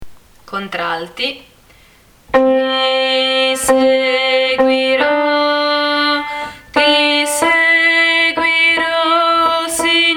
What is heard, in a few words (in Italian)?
Contralti